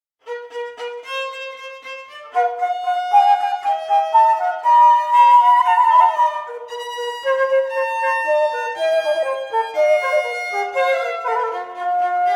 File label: Suitte C-dur Fugue; Hotteterre; op 8 movement 3